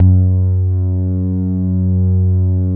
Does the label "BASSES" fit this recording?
Yes